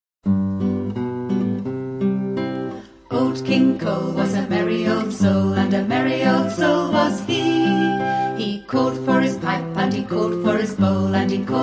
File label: piccolo old king cole